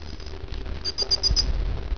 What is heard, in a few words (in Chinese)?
普通翠鳥